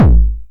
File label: 909 BD Tape Sat